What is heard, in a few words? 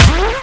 Needle Kick